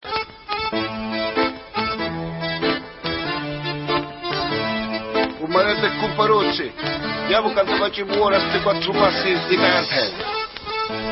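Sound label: Instrumental, Israeli Dances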